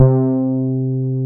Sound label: Korg POLY800 C2; Back In Time Records; Korg Universe VOL-1